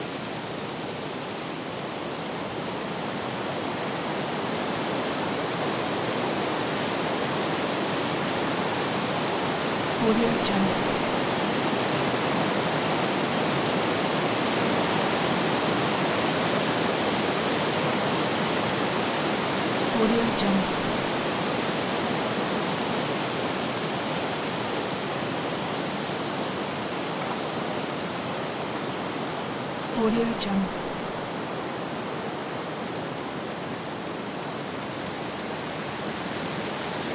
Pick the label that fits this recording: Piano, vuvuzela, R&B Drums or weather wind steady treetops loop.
weather wind steady treetops loop